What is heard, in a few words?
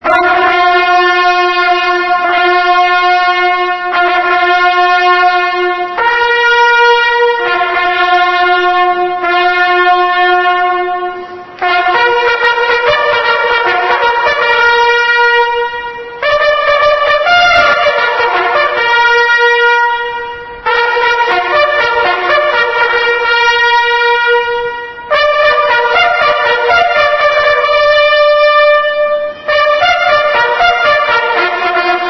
reveille